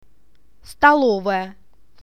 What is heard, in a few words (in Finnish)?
ruokala